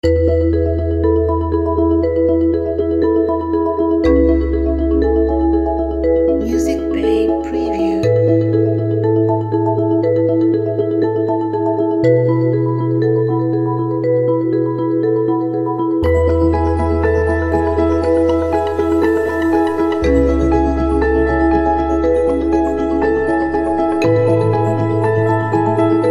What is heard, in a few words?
Calm Vibes